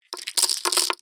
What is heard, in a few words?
win bet, 3 card poker